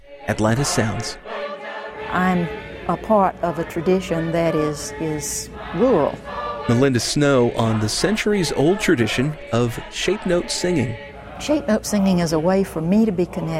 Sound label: Shape note singing; atlanta